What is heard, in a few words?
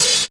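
Yamaha RS7000 hi-hat cymbal Eb5; Drum machines; Yamaha RS7000 Cymbals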